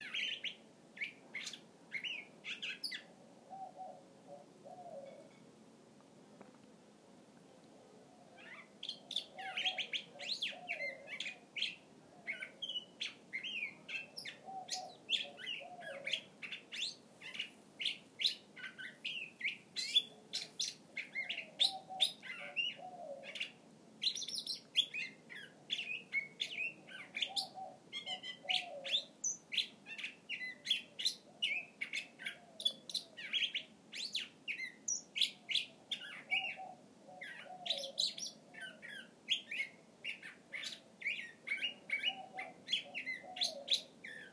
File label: Curved Bill Thrasher